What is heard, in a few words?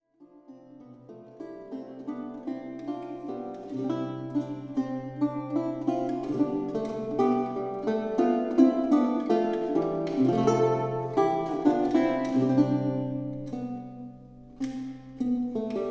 guitar chacone